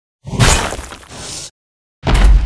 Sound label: Mob die; SubBossmob; 욱일승천류; 가토; 2단계